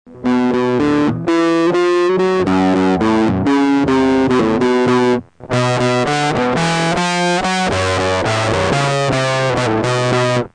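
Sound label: Bobtavia, EasyDrive, EasyDrive into Bobtavia, guitar; Apologies to Page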